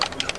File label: xm8 foley